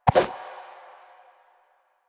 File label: Run Whoosh, Footsteps; Warframe; Lasrian; Lasrian Soldiers; Foley; Lasrian Butcher